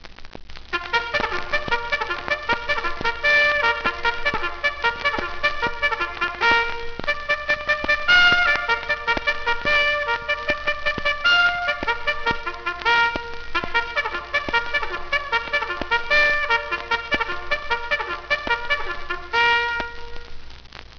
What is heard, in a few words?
reveille, Bugle; Cliffside